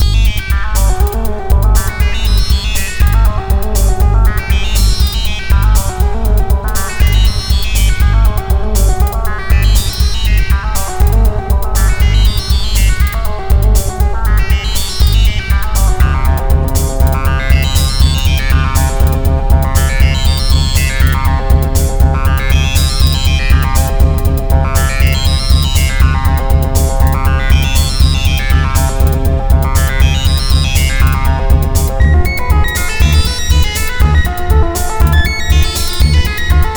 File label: exp disting wavetable